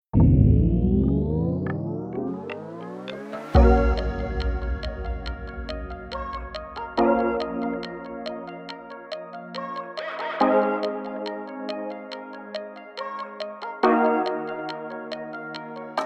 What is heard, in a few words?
Hustle Instrumental